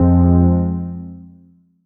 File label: stab slow, stabs